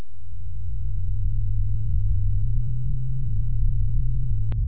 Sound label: breathe loop, fast zombie; raptor zombies